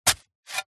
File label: shovel metal dig soil single; industrial